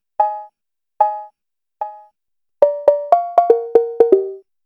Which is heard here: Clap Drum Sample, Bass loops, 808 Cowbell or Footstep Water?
808 Cowbell